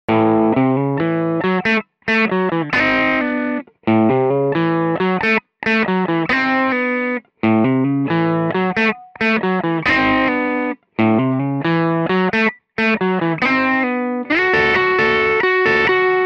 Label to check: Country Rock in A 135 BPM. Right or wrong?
Right